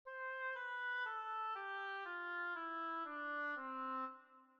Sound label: Piano